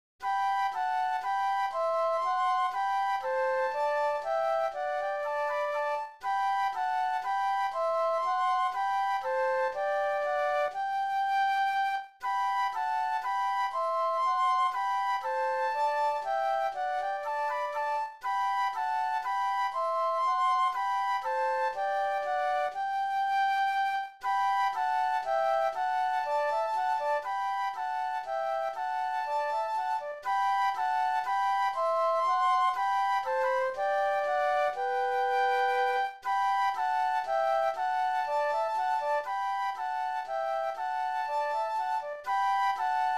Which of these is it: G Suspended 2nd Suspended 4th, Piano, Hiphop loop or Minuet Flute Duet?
Minuet Flute Duet